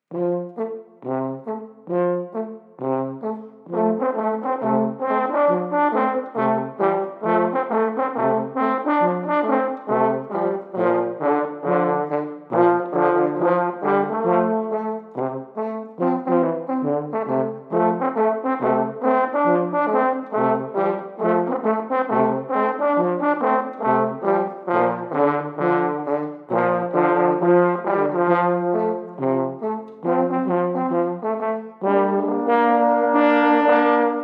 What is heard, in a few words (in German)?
Hornensemble